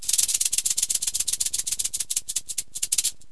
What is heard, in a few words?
rattler, animals